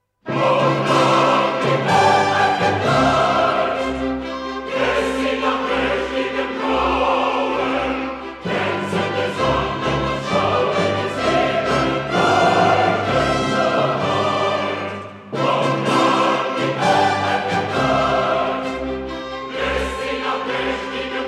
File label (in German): Rundfunk-Sinfonie-Orchester